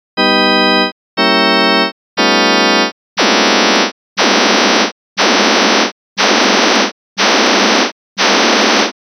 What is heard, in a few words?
cluster octave